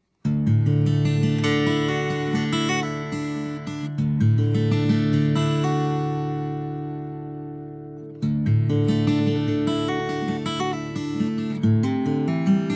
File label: Guitar